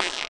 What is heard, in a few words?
Fart; Ed Edd n Eddy; Adromulus Pack; EEnE